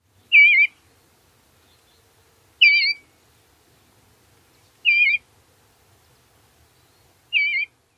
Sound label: tura lee, bluebird